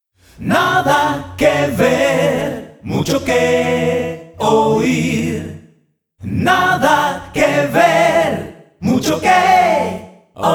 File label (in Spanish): voces